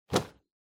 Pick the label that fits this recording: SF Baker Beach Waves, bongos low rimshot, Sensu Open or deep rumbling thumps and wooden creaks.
Sensu Open